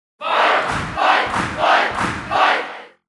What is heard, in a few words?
Sfx American football